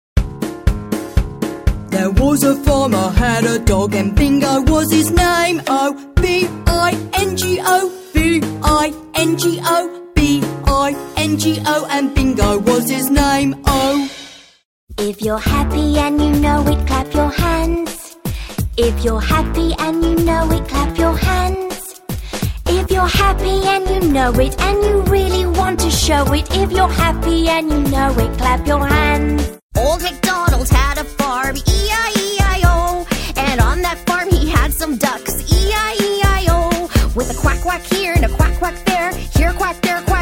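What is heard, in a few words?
British, Songs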